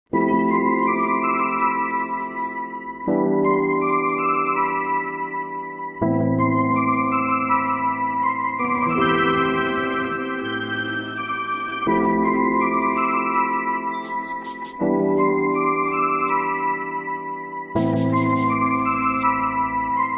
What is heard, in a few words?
sega saturn instrumental